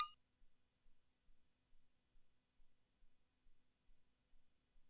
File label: Cwbell, ELKA X1000